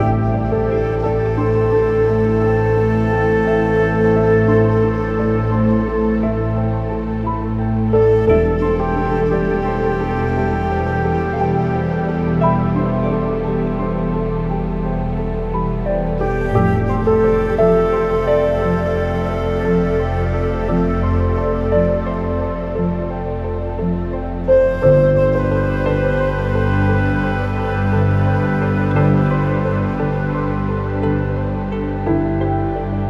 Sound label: Library Orchestral